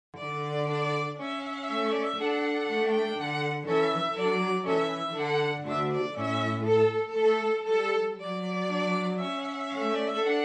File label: Trumpet Voluntary by Clarke, classical string quartet